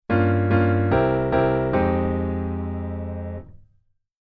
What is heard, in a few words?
Jazz Waltz Chord Intro